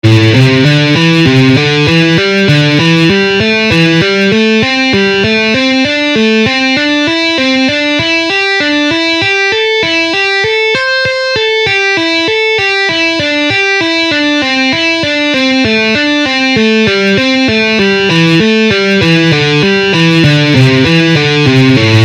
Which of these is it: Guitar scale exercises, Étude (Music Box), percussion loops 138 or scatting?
Guitar scale exercises